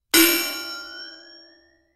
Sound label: thunk